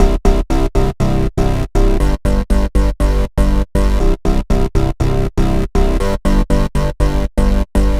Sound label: TG Bass, Bass Foundations; Sonic Foundry (Sony Creative Software); The Electronic Music Manuscript Collection